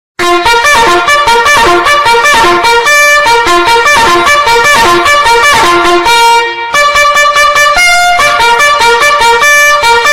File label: wake up ringtone, alarm